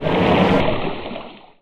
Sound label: bloodbath; the monster plane v2; kolmods skill